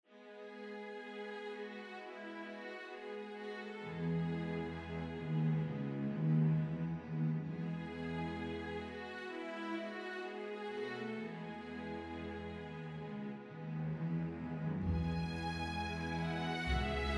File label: Strings Dvorak; Dvorak Serenade